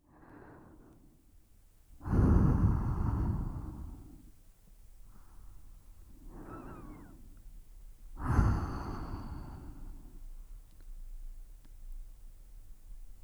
サイレンス・スリーピングナイト 寝息・右

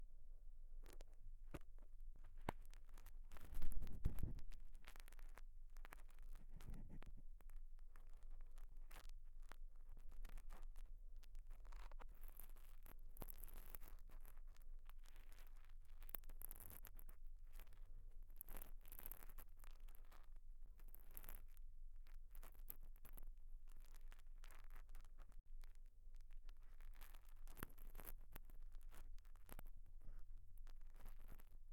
やわらかブラシ耳かき, 左耳

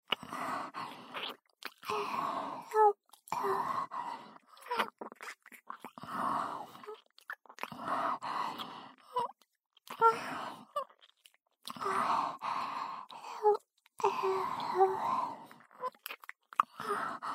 キスループ

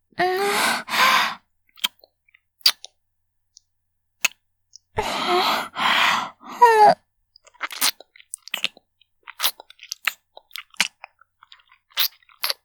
感じるキス, ASMR

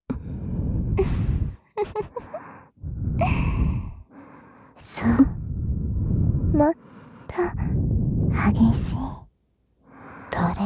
お耳を鍛える両耳舐めゲーム; ASMR

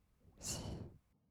し, ブレス 吐く音; 1200 kawaii voice!!; ブレス 53種

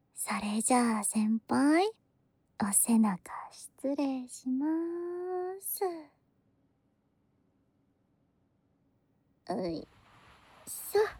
籠絡ボディマッサージ

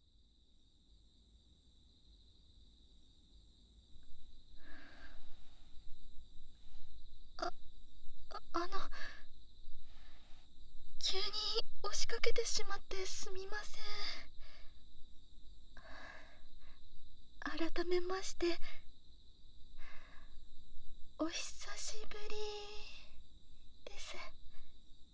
耳かき, ASMR; お隣さんの耳かき

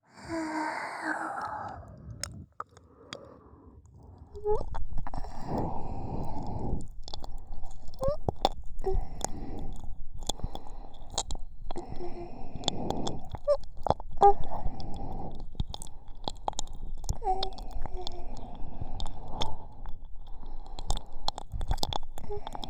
耳奥 埋め＆閉じ 舐め 耳奥 ピストン 舐め 激しく耳 奥 舐め; ASMR

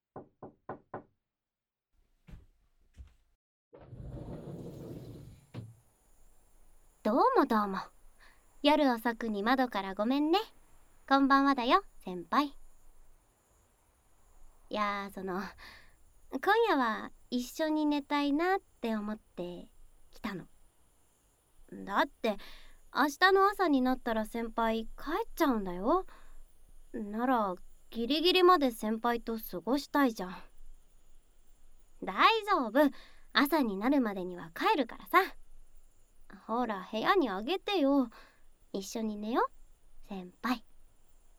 添い寝 SE大, ASMR; 効果音大きめ

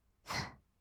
ブレス 吸う音, "す"; ASMR; 1200 kawaii voice!!

